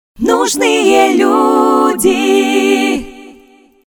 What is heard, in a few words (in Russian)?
аудиологотип (джингл)